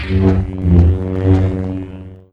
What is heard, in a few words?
Lightsaber Hum